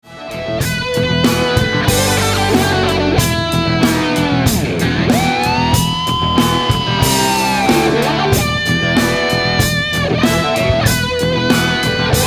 Wah Wow

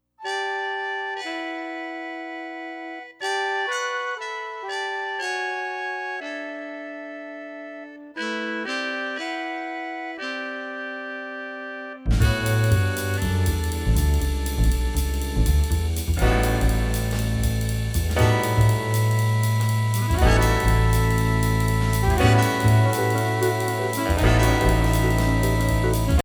Jazz Suite

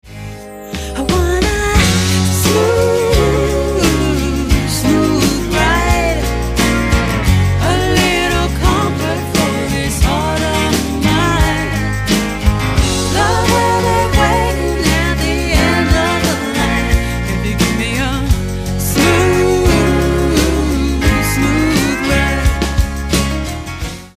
Pop

